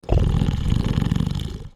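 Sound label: dragon ambient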